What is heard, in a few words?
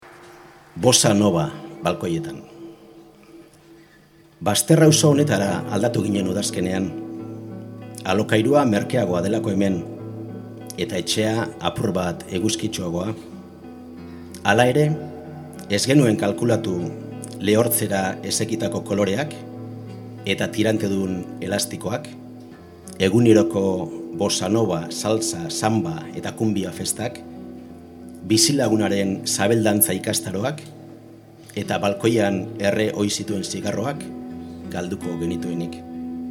bossa nova